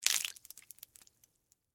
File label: Water Spill Splash, Small; Splashes; Objects; BlastWave